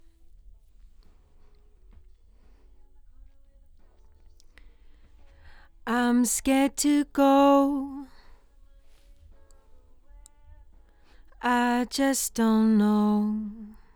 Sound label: LdVOX Double